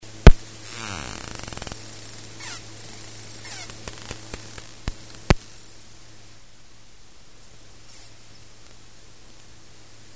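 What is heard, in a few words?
Tursiops truncatus echolocation; Tt echolocation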